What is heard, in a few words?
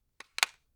cell phone flip phone pull out of pocket open; prosoundfx